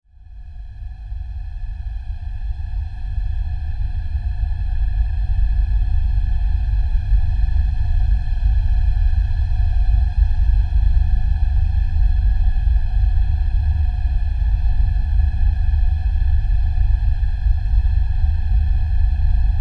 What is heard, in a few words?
Sci-Fi SFX